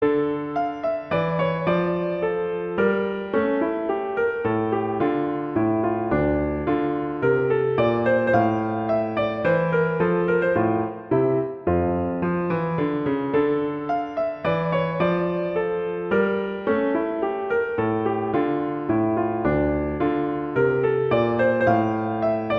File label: Minuet; Bach; Minuet D minor